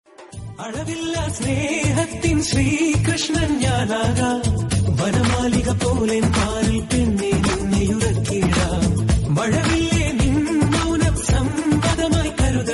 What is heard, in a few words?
malayalam; pushpa